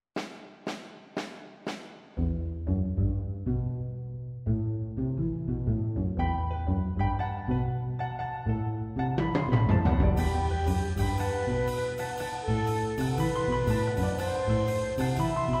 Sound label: Off Beat Flute